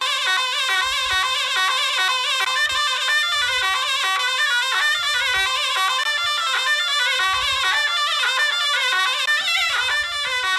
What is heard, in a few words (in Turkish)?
ZURNA SESI